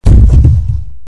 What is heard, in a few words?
fall; openspace3d; kidkitchen